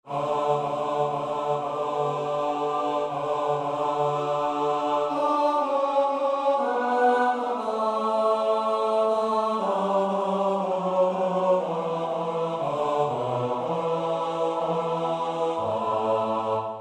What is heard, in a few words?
A lovely rose is sprung BASS